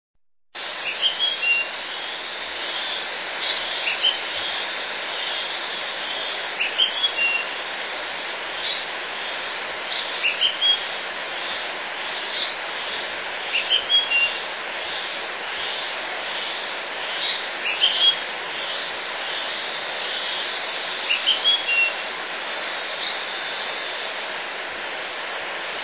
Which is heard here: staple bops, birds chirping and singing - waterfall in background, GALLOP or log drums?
birds chirping and singing - waterfall in background